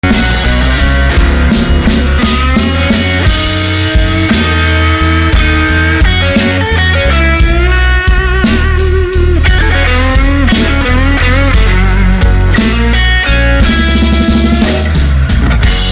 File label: 808 Blues Jam